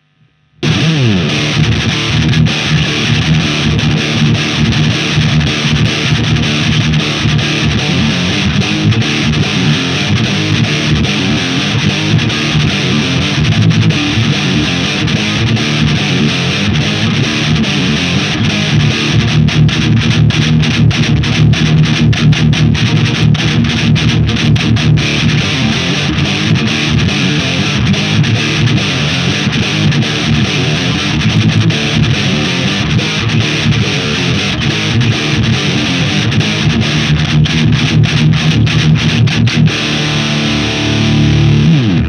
drive drop D, guitar

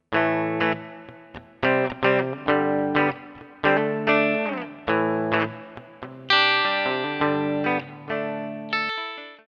eterna line bridge

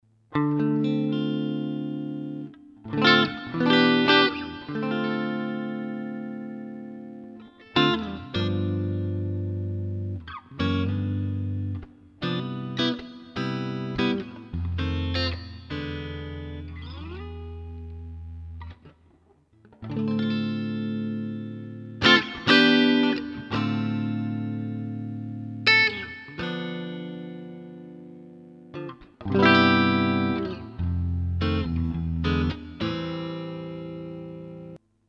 Eterna line phase, soviet guitars

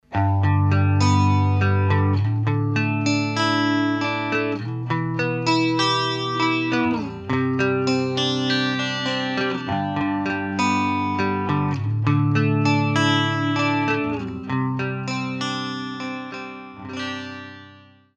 eterna vox phase

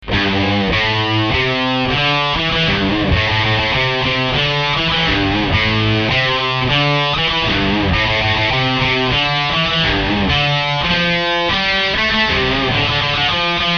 xtc med gain

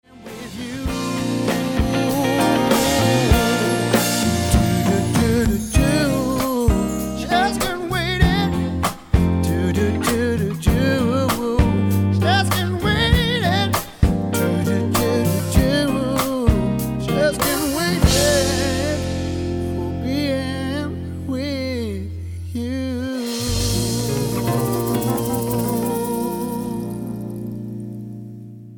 mix chorus; eterna

